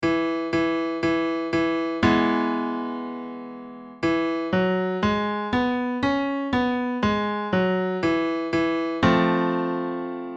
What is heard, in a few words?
Pentatonic Scale